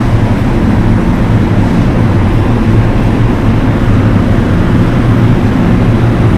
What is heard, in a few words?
klaxon, Train; OpenBVE; Jerome Yard